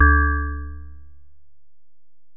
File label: Bell, Alesis DM-PRO